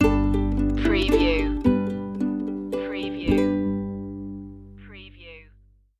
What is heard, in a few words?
silent night ukulele stinger